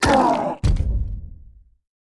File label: Knocktaur Dead